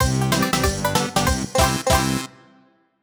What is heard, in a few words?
Collect Jiggy (Short Ver.); Banjo-Kazooie